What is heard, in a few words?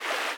fast water, river sounds